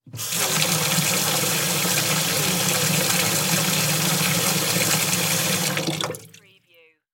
Utility Sink On Off; sound effects bible

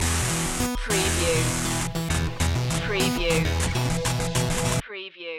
beat boy stinger

